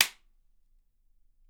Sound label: WHIP; Miroslav Vitous - Percussion & Harp